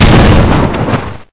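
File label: impact concrete, debris; Source Engine; bshift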